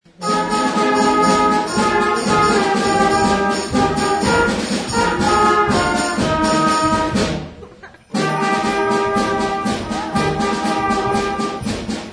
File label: TANGO BELLS; COLBY